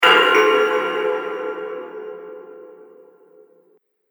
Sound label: The Devils Doorbell; Horror; Doorbell Sound Effects